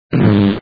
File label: one fart